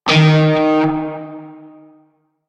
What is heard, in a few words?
Shawzin Oct Two D Sharp; Music; Warframe; Narmer Shawzin